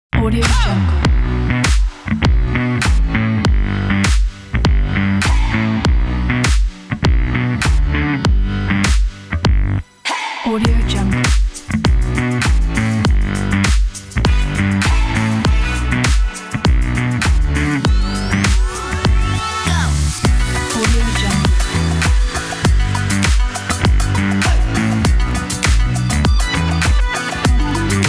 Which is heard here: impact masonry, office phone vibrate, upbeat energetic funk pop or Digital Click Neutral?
upbeat energetic funk pop